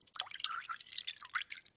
pouring milk; minecraft; technic

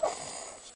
rabbit death; minecraft; technic

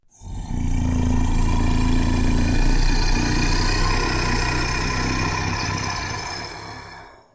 ogre; minecraft; technic

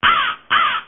bird black; minecraft; technic

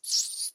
mice grunt; minecraft; technic

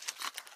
scorpion grunt; minecraft; technic

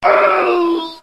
werehuman dying; minecraft; technic

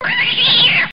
kitty hurt; minecraft; technic

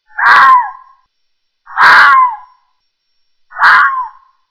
fox call; minecraft; technic

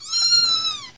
kitten dying; minecraft; technic

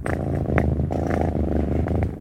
kitty purr; minecraft; technic

